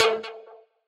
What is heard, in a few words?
Serum LD Frying Pan; Synthetic's Serum Collection